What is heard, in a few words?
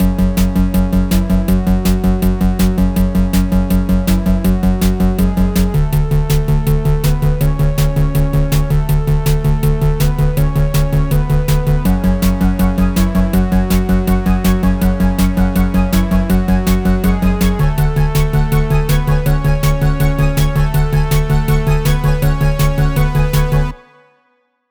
Chiptune; Chiptune Vol 3 Music Pack; Cherries